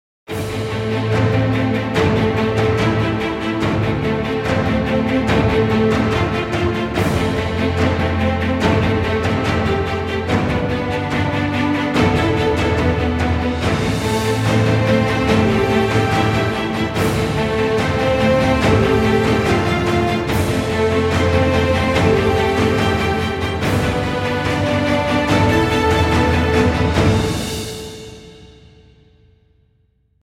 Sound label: Epic Action, Background Music